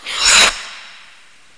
skate end; My Animated Word Book

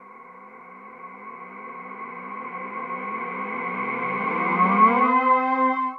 synth pad ambient 160 C; Sphere

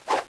slash

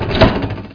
Start Machine Sound; Widget Workshop; Maxis

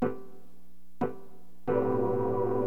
STABS, HipHop; LiveAct Pool

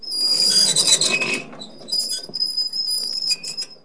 Metal Wheels Turning, Weird; 16-bit; KABOOM! - Wild and Wacky Sound Software; 1,000 Sounds

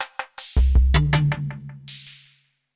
PERCUSSN, AMBNT, 160

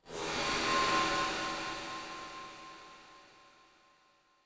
air loop; fx; PC Gamer IT CD 40; SMACDEMO

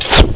Guillotine; Troubled Souls

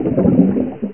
bubble; PwrSlave

